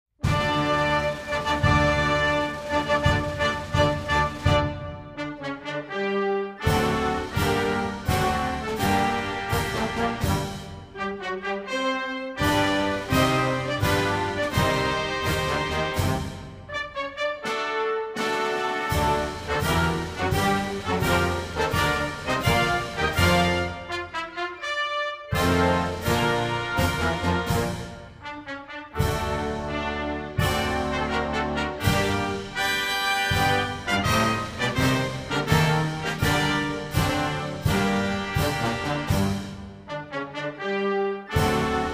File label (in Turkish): Istiklâl Marsi instrumetal